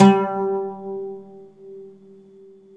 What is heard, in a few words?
OUD G 3